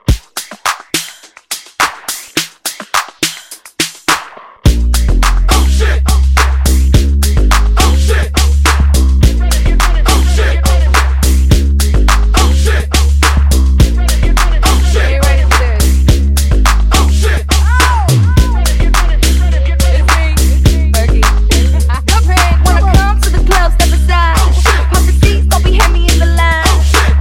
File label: Mashup 105 DIRTY